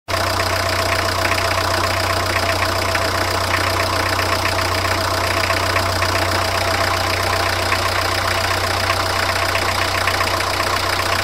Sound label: engine